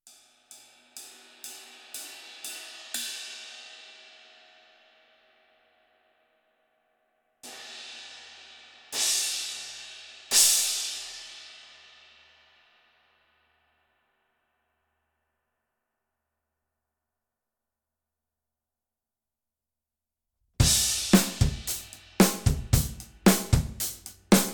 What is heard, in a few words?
Dual Crash